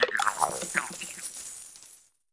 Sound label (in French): Cri Coffre